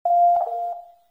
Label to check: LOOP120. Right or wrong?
Wrong